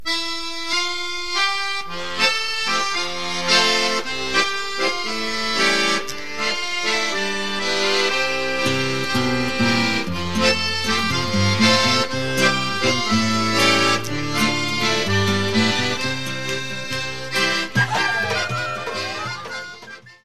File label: Polka Cherkesse